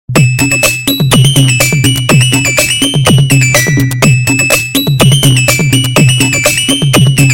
Airtel Beat Mix

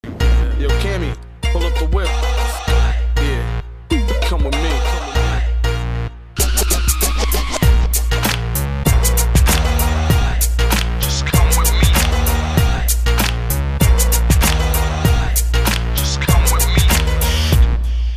High Base Rock

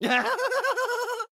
laugh; junkrat

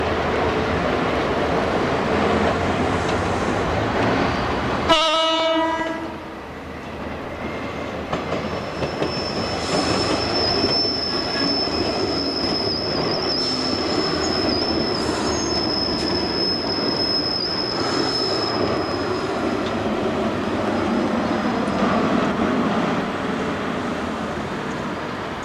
horn; FGC MTM 211

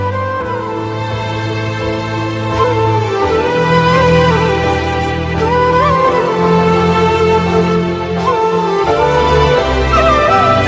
Movie Bgm

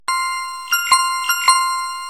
Cycle Bell

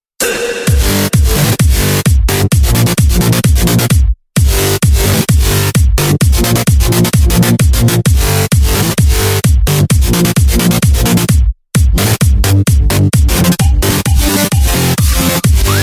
Dance

